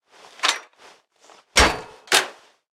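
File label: Weapon rifle railway reload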